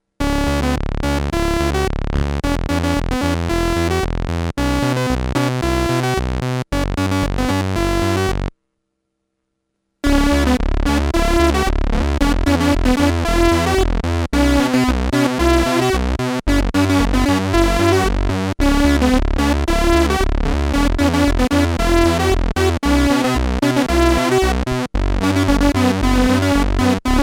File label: Vibrato